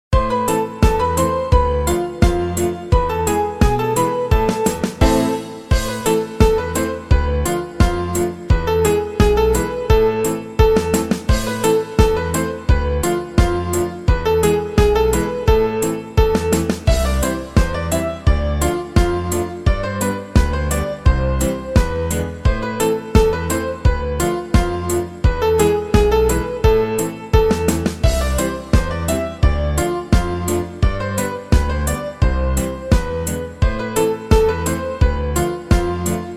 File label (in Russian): detskaya polka